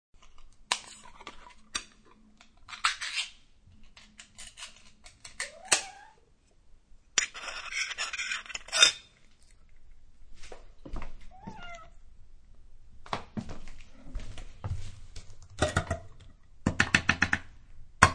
Sounds of feeding a cat including opening can and emptying can into bowl.; Cats